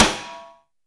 focused snare drum b key, acoustic snare tuned to the B note